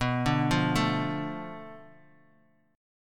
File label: B Minor 7th Flat 5th B Bass Standard, chord; Bm7b5; arpeggiated; 19,17,15,14; JGuitar